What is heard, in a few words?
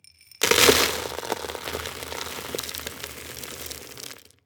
Pebbles